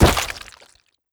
flesh; Rust

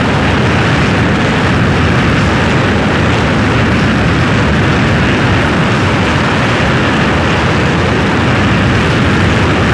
Aircrafts Engines Mig15 Back Engine; DCS

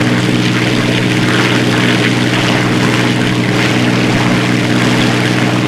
mustang rear, engines; War Thunder

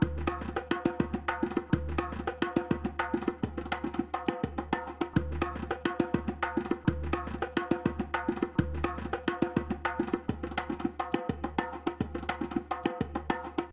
music interactive; World of Tanks

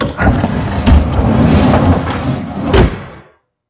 Uh-1H pass door open; Aircrafts; DCS

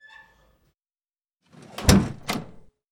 Aircrafts Ka-50 Door Close; DCS

